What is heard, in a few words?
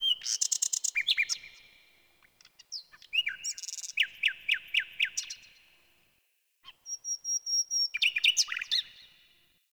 Tree Critters; Warframe; Eidolon Plains; Ambience